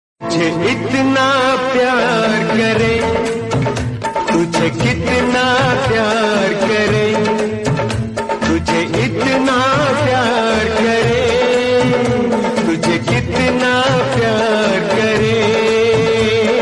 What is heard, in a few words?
bgm theme remix love